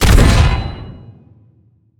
big axe

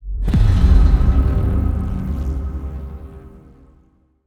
ui lose settlement

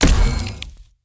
mag close

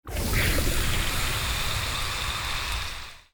seaborn pain

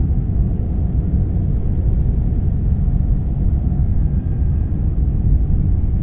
energy well

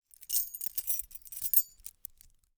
key

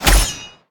atk goldspear

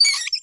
faucet off

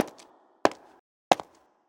high heel fts

